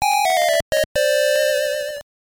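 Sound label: Jingle, Game Boy Color; Battle Fishers 2000; gbc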